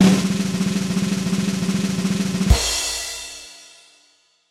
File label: drumroll